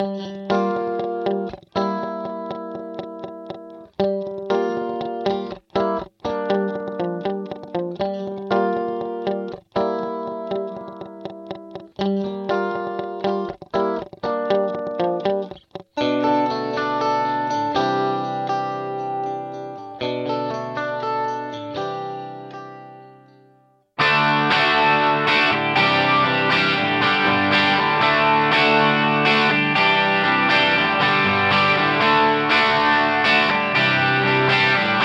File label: Guitar Yamaha Rev7 Chorus A Vintage Digital